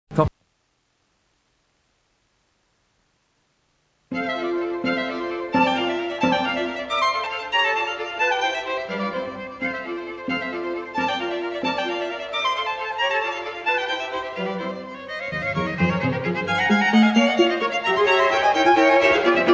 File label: String Quartet